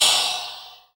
FX Dance

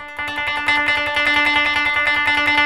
KOTO TREM

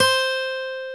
GTR C5, Dual Guitar; Club-50 - Foundations; Paul

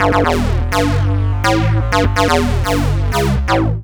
Square Bent, Synths; Sonic Foundry (Sony Creative Software); Underground UK House & Electro

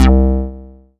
Synths Note C; Best Service; House Definition VOL-1

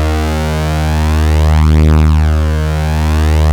OB D#3 -L, Rare Synthesizer; Hollywood Edge - Giorgio Moroder Rare Synthesizer Collection; OB 4 VOX.

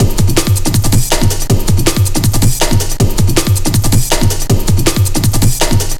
160, Drum Loops, Drum & Bass; Total Drum&Bass

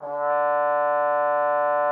TROMBONE, D3; Xsample VOL-3

